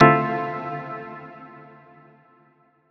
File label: Stab Dmin; Ableton Live 12 Lite; Core Library; Apprentice